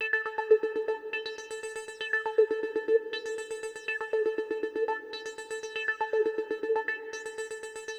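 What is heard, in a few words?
Wave Perco Synth Arp, Amaj, 120 bpm; Pop Rock; Digidesign; Pro Tools; Pro Tools Essential Loops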